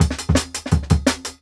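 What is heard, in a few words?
Jungle Breaks, 169 bpm; Shiff; Original Jungle Breaks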